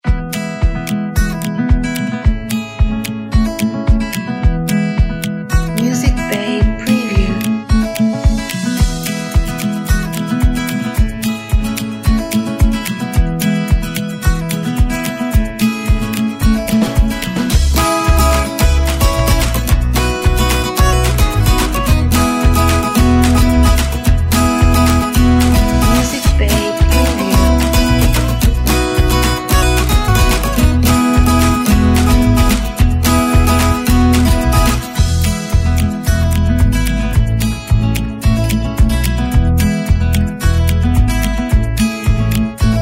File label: upbeat acoustic